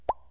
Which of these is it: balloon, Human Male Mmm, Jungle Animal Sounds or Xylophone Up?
balloon